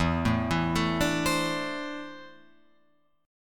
E 7th Suspended 4th Sharp 5th, Guitar, Open F; E7sus4#5 arpeggiated; JGuitar

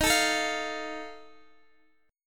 Eb Suspended 2nd Flat 5th Eb Guitar Open D

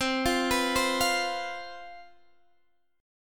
C Major 7th Suspended 4th, down, Guitar, Drop D

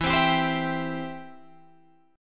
E Minor Sharp 5th E down Guitar Open G

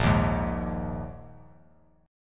Bb 7th Suspended 4th down, Bb7sus4 chord; strummed; JGuitar

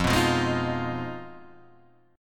F Minor 7th Flat 5th F down Guitar Half Step Down

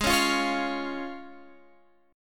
Db Major Ab

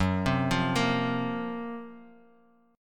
F sharp Major 7th Flat 5th F sharp, Bass Standard; 14,15,15,15; arpeggiated

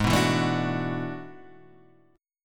E 11th Ab

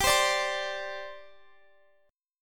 G Suspended 4th, Guitar, Open F; Gsus4 strummed; x,x,19,19,17,19

